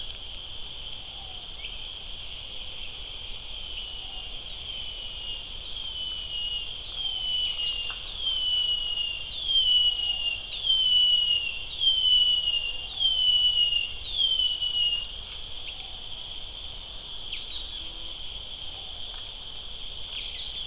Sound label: Brown headed greenlet; صدای پرنده سبزک سر قهوه ای